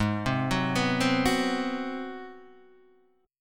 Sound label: G sharp 7th Sharp 9th G sharp; G#7#9 Chord {4 3 4 4 1 2} arpeggiated